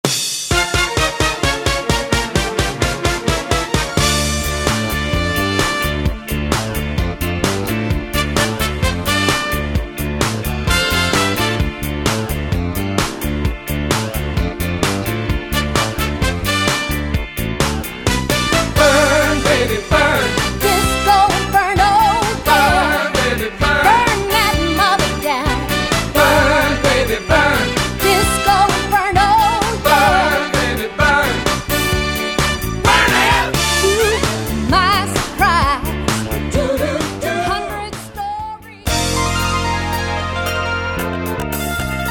Cover Band, disco; Indianapolis

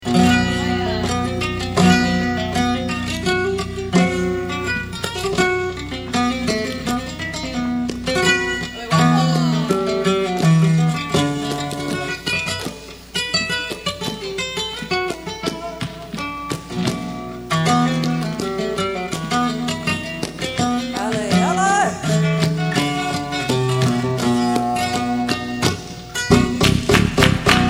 Flamenco Group; New York Flamenco Group

